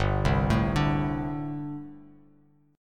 G Suspended 2nd Suspended 4th, Bass Standard; Gsus2sus4 arpeggiated; JGuitar

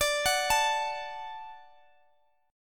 D Major D down Guitar DADGAD

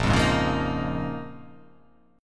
Bb Minor 11th, Bbm11 chord; strummed; JGuitar

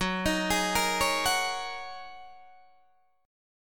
Gb 6th Add 9th Gb down Guitar Open D

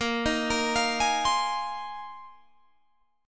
A sharp 9th Suspended 4th A sharp Guitar Open G

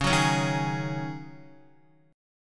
Db Suspended 2nd Suspended 4th Db, Guitar; strummed; JGuitar

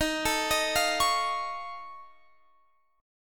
F Augmented 7th Eb, chord

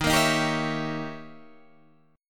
Eb 7th Flat 9th Eb, Guitar Open D

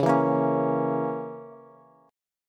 Db Minor 6th Db Bass; Dbm6 strummed; 21,19,20,18; JGuitar

